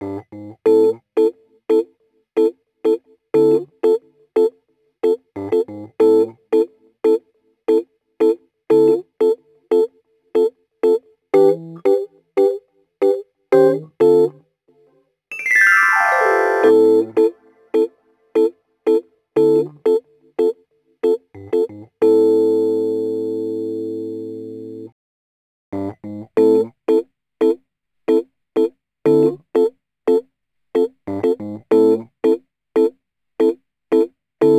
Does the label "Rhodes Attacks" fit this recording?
Yes